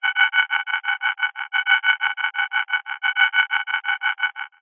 science fiction radio receiver transmission interference burst pulsating lost; signal; zapsplat